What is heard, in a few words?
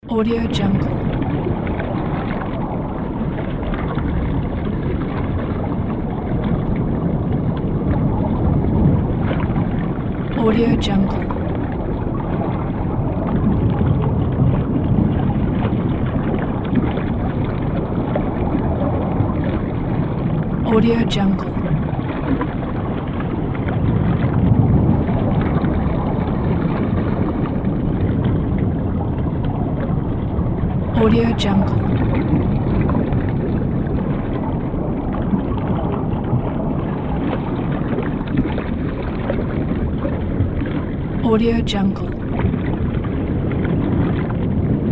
rumble underwater